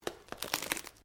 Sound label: Branches Dead Strain; Material components for constructing more complex sounds; Breaking (Sound Effects:Objects)